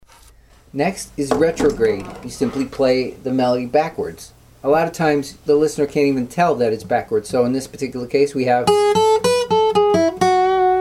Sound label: varying a melody